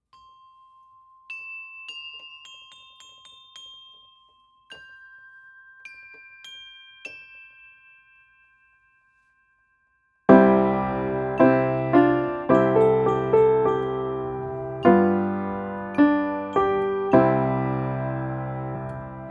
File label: Karaoke; Kling Gloeckchen Klingelingeling